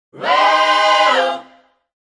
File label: african vocals weeh oh high, African Vocals Sung